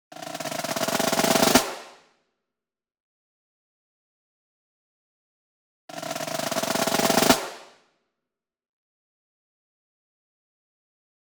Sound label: SINGLE SNARE Pearl Marching Roll Near; boom library; Cinematic Strikes Construction Kit